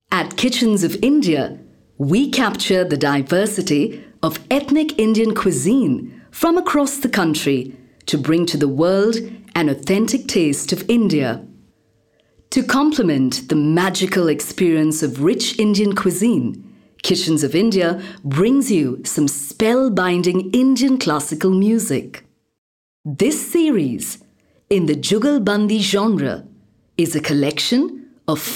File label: Shehnai and Tabla Instrumental; Indian Classical Music